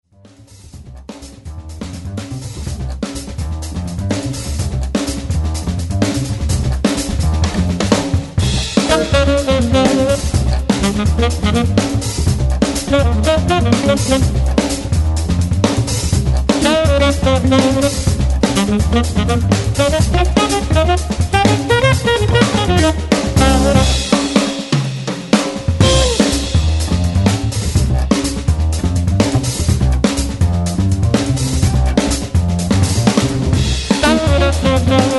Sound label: Funk